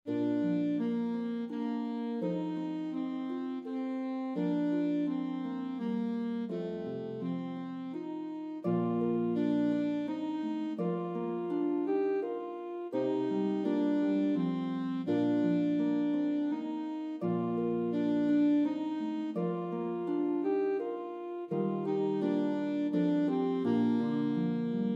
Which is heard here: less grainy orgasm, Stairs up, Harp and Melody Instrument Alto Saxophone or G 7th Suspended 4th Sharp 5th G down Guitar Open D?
Harp and Melody Instrument Alto Saxophone